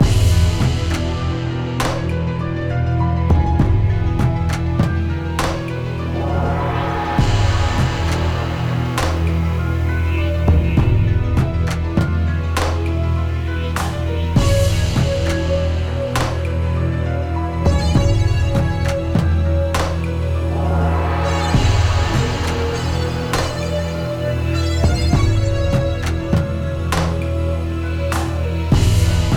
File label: Pause Menu; UMK3